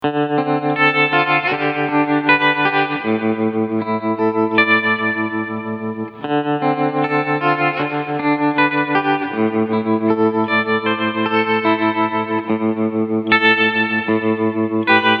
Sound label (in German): Beides Fast Vibrato